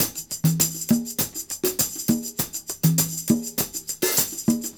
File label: Percussion, R&B Drums